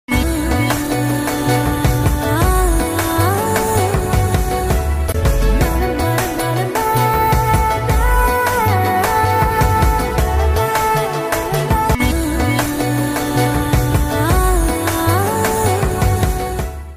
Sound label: background music ringtone